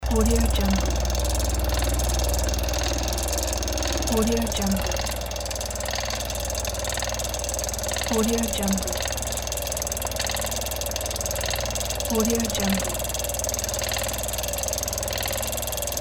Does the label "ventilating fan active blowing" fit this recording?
Yes